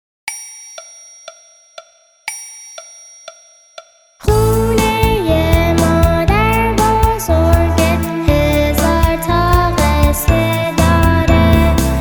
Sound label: Vocal